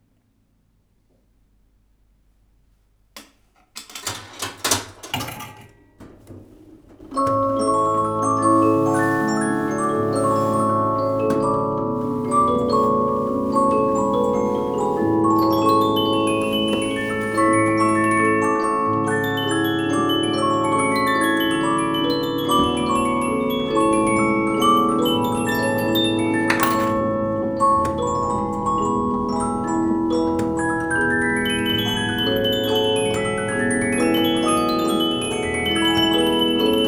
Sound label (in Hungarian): zenedoboz femlemezes polifon penzbedobos; lipcse; verklihangmuzeum